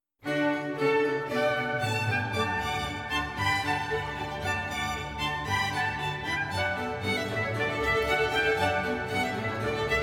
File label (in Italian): Sinfonia in Re Maggiore con trombe allegro